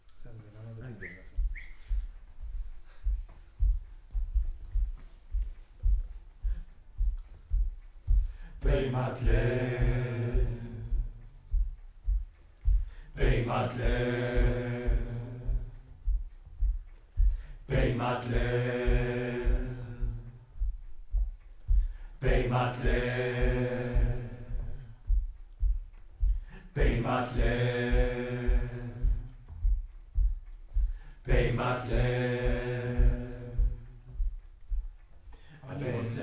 Choir Far

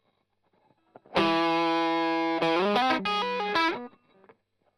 Strum Line

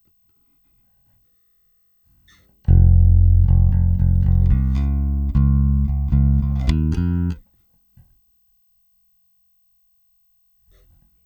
EBass DI

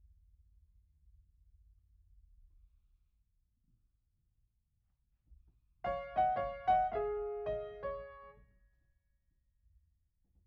Piano Mono

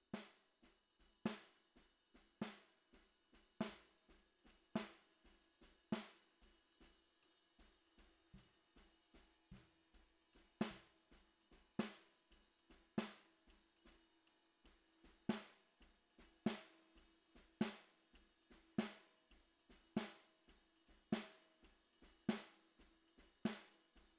SNR Bot

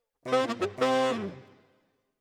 Tenor, RNR for piano

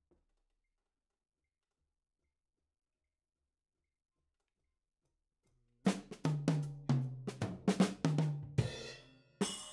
Ragtime

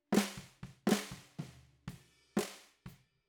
SNR BOT

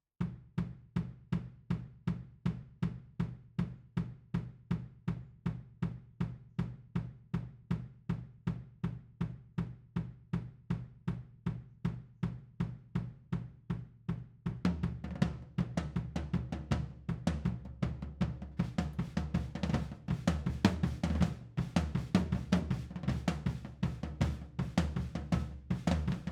Overheads, 160 BPM

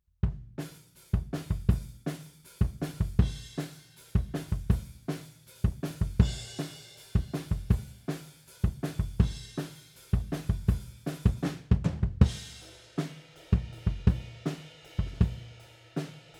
Kick Out, 160 BPM

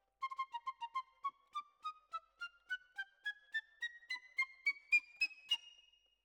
Flute

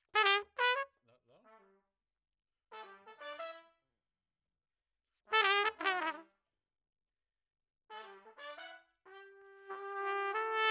Trumpet

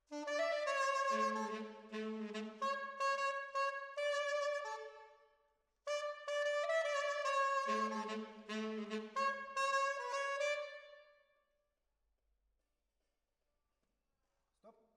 Decca, Jazz for piano

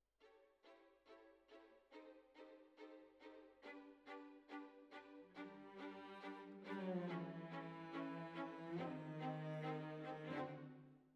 Violas Over

